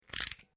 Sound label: KNIFE HOBBY CLICK RETRACT